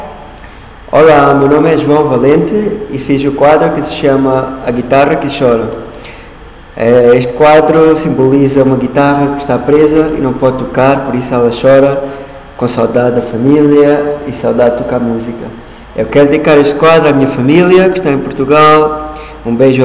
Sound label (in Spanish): LA GUITARRA; per nadal un conte 2019